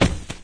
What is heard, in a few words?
put away gun; There; ThereClient